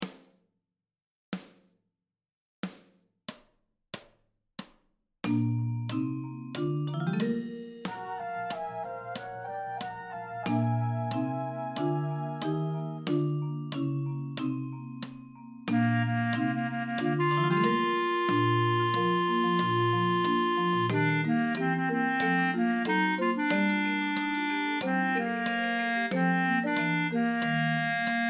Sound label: w Clicks